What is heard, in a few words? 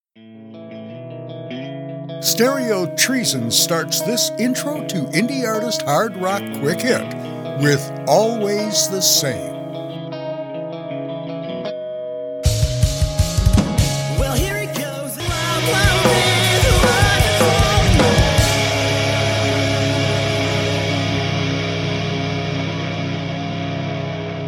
hard rock song